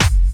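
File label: snare drum bass drum, Dance Mega Drums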